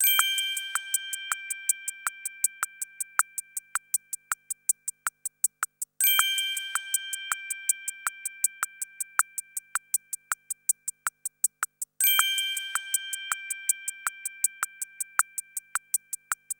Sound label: Ring Rhythm, alarms; LG Qua tab PZ LGT32